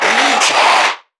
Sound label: NPC Creatures Vocalisations Infected